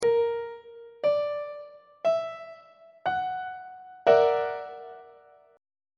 f sharp augmented 7th 1st inversion on treble clef